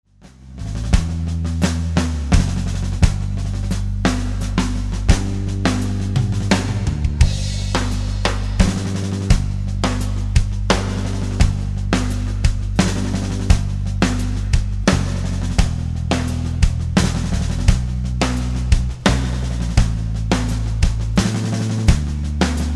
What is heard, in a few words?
rhythm